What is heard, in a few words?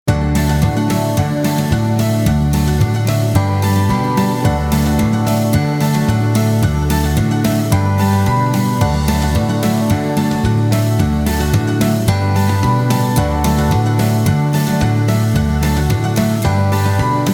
Hoedown, On-Hold Music; On-hold music genre